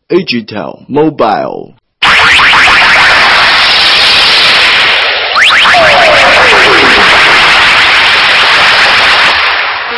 Power On; AgTel AG Race

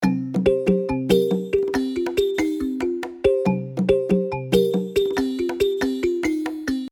Kalimba, ringtones; Lenovo; ZUI 13

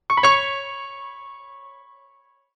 Trill Email, Classical; HTC

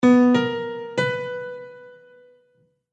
Piano; Aio Wireless

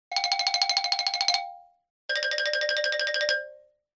Marimba, ringtones; TCL 60 XE Nxtpaper 5G

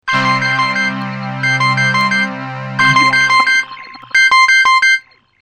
Alarm; Coolpad Rogue

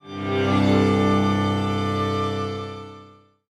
roll out strings; Rollable LM R910N; ui; LG

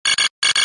Notifier Loud, BlackBerry; OS-4.6

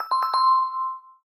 Doorbell, notifications; Honor; MagicOS 7.1

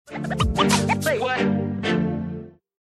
Message tone; Samsung; GT-S5520 Nori; Alert tones

